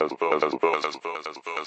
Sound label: 144 filter stutter; VOCAL OR VOXY LOOPS